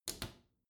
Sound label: bathroom cabinet close soft